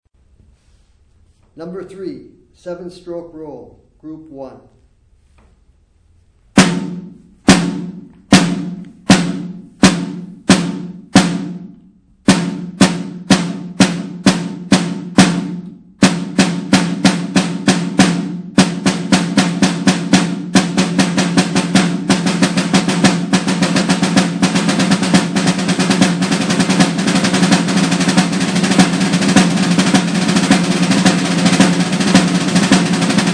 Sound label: snare rudiment